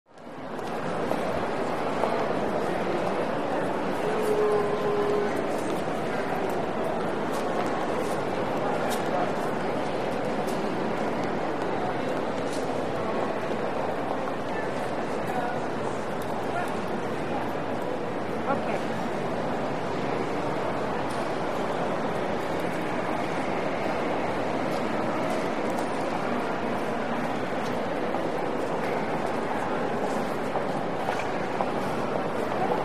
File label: Huge Marble Int Lobby